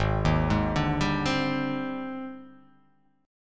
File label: Ab-Major Sharp 11th, AbM#11 chord; arpeggiated; JGuitar